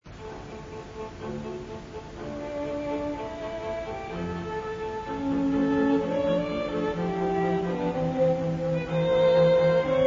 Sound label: Quartet